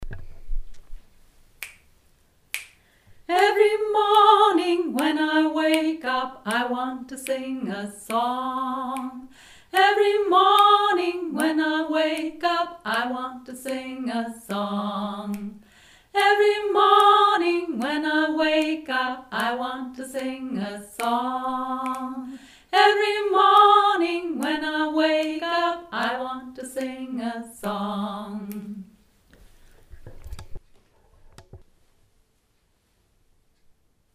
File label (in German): einstimmig